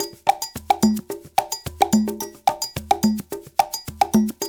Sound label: Soukous; African Contemporary; Spectrasonics; Supreme Beats